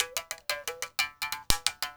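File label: SM MOUTH BOW; Spectrasonics; Heart of Africa 2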